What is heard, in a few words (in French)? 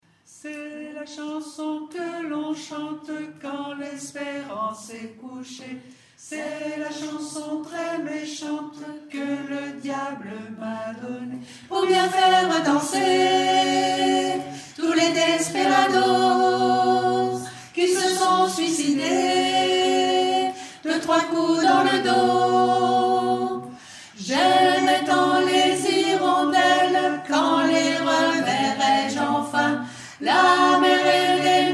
soprane; Diabolo